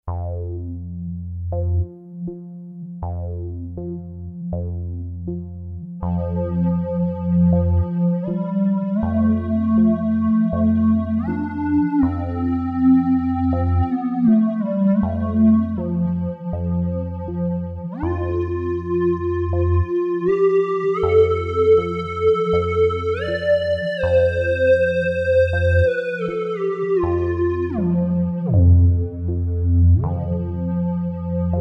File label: ELECTRONIC BIRD